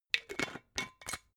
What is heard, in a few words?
glass bottle massive close